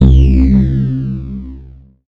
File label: Single Electric Basses, Dance Floor Bass